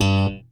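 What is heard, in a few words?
WARWICK THUMP G2, BASS; Masterbits CLIMAX 7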